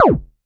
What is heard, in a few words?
vermona drm1 mk3 fx